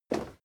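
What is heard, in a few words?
step crowd run quiet single right, footsteps; schritte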